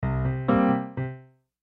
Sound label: Piano Blues Accent; Musical; Blastwave